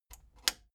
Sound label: plastic button latch scratch disable slow